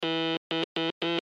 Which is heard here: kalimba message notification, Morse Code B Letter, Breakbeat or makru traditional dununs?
Morse Code B Letter